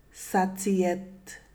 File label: tickle